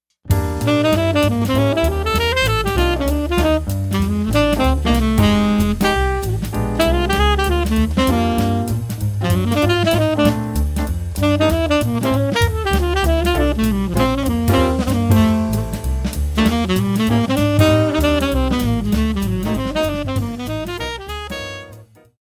etudes tenor; cicero avenue

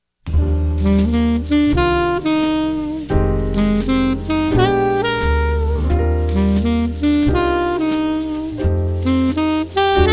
sax phrasing alto; Fargo Avenue

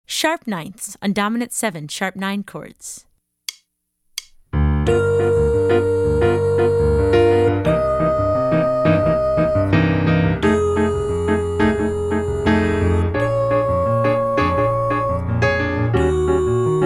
sharp9 On Dom7 Chords

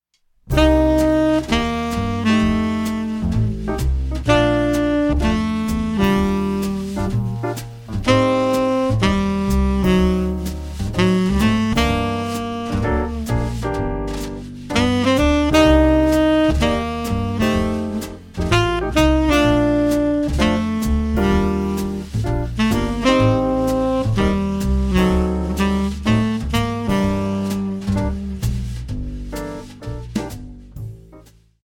sax phrasing tenor

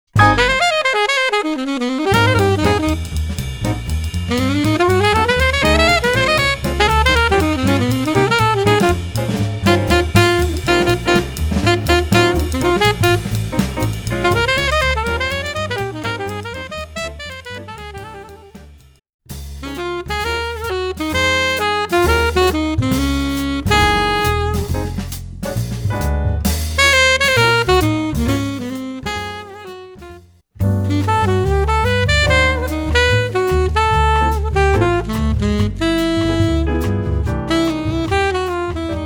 etudes alto

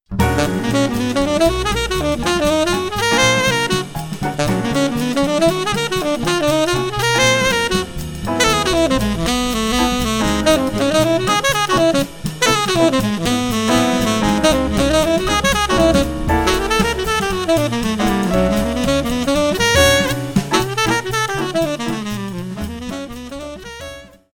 Hip Licks for Sax tenor fast

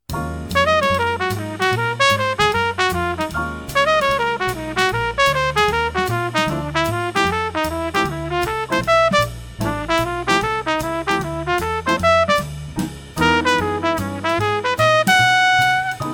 hip licks for trumpet slow

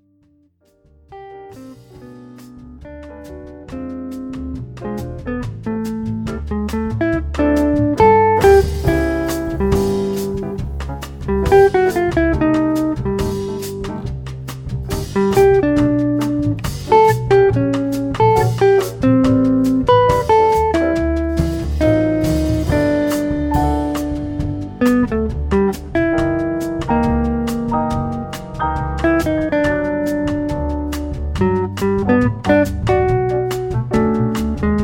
Guitar Rhythm; Belden Avenue

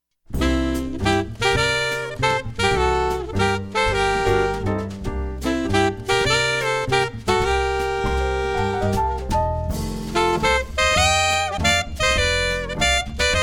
sax duets altos

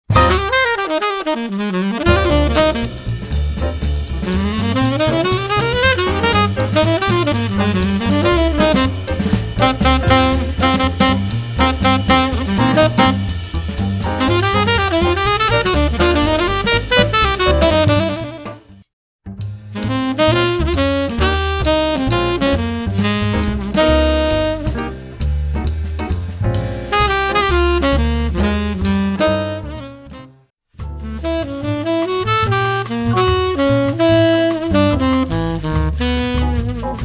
etudes tenor

